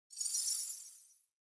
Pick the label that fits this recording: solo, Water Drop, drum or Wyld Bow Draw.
Wyld Bow Draw